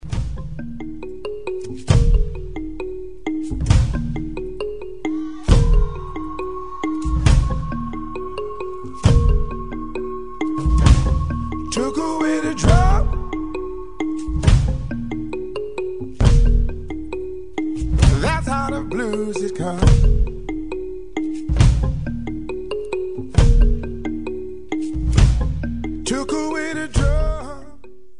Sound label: the Drum